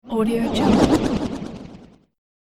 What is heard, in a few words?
disappearing flutter